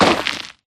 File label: Mvmt FS Jog Dirt Layer; AI Stalker; Titanfall 2